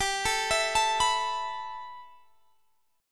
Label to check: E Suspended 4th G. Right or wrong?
Right